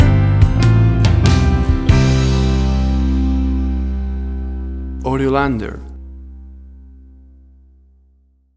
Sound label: Warm Romantic Modern Guitar Ballad Stinger